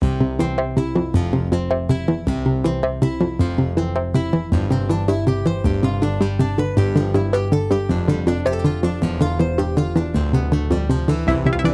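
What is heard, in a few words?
Dream Dining Hall music loop stereo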